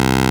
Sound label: P5 PUL, PLS, Vintage; INIS - Vintage